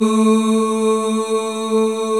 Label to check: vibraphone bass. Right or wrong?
Wrong